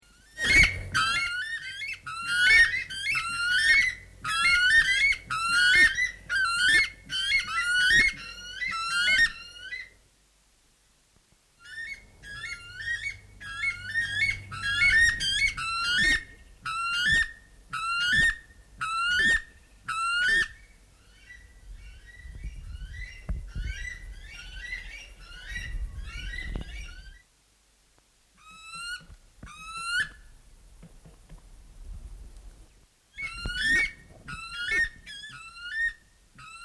buff weka song; conservation, native animals, birds, bird song

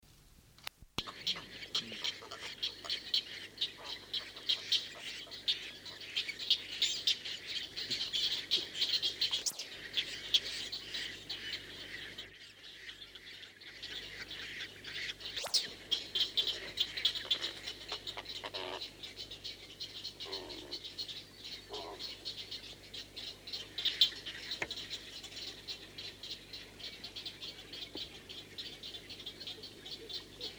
white heron song; conservation, native animals, birds

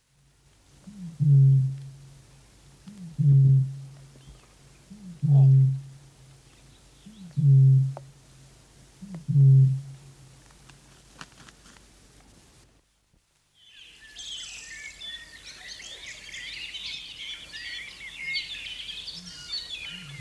australasian bittern; conservation, native animals, birds

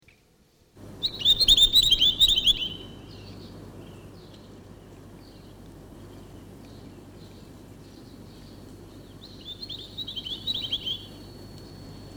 chatham island tomtit song; conservation, native animals, birds, bird song

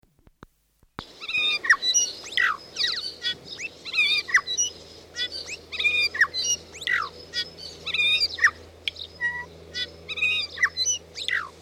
bellbird; conservation, native animals, birds, bird song

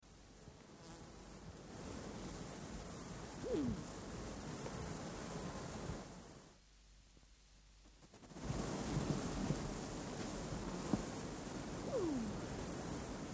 chatham island pigeon; conservation, native animals, birds, bird song

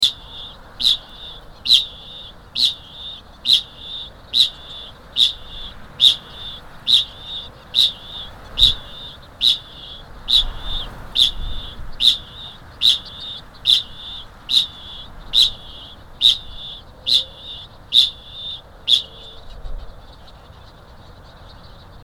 kakapo; native animals; birds; bird song

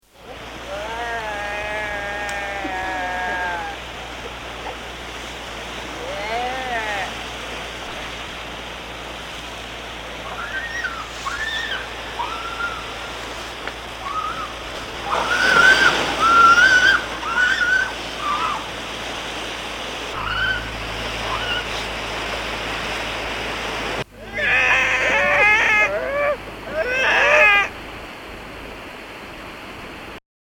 fur seal; conservation, native animals, marine mammals

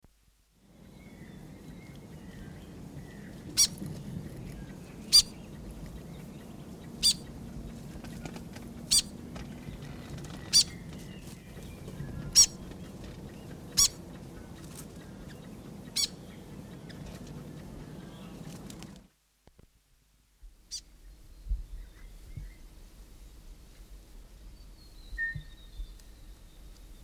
south island fantail; conservation, native animals, birds, bird song

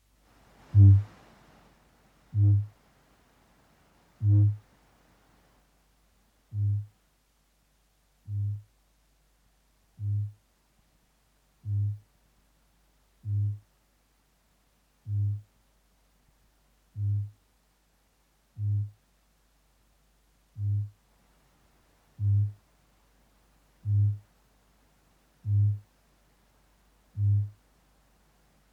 kakapo; conservation, native animals, birds

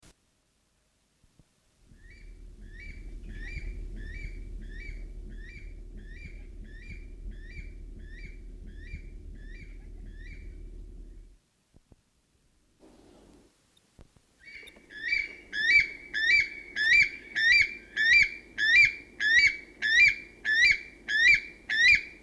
north island weka song; conservation, native animals, birds, bird song

